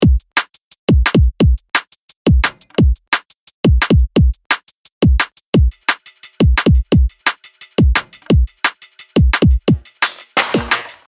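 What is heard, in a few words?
174 drum loop, Drum & Bass; Tech Flavours; Drum & Bass Sample Pack inspired by the sub-genre of Tech Step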